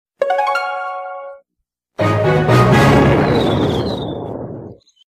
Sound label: start up sound; clash of clan